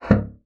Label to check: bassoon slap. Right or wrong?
Right